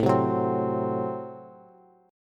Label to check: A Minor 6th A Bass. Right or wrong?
Right